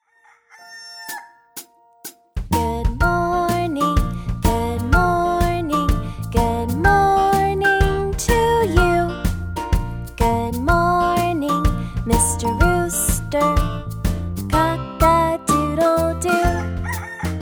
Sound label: good morning mr rooster; Animals